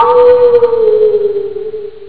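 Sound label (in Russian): вой одиночный долгий высокий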